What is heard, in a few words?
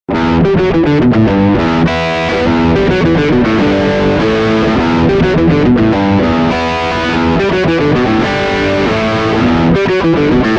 Neck Drive